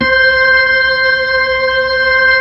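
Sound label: B-3, Hammond B-3 organ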